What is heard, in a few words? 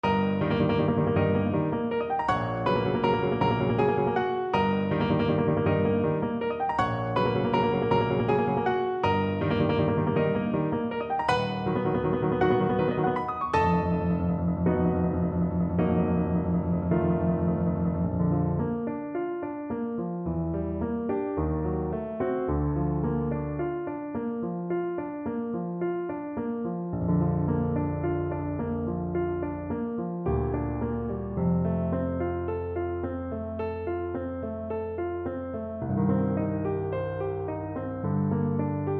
amor ti vieta, Tuba